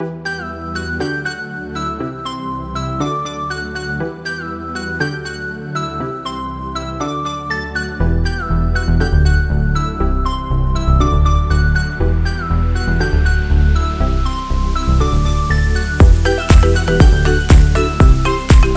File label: Kit Full Loop E